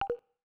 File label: overlay close; Tom Clancy's Ghost Recon Future Soldier; orbit